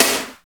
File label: SNR, Tekno Industrial; East West